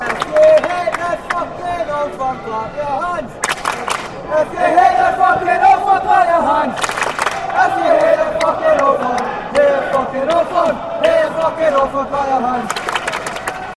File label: if you hate old firm clap your hands